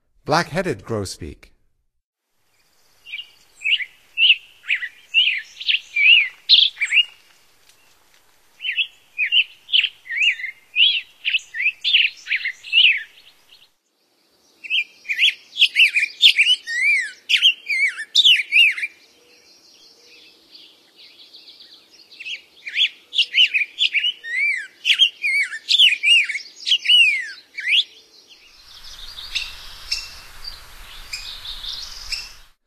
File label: Black-headed Grosbeak, Songs of Birds of North America; Stokes